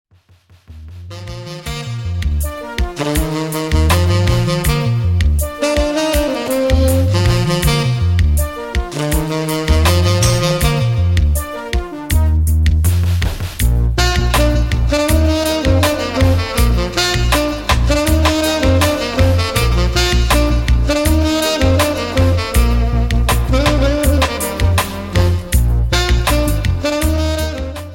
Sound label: Old Time Sax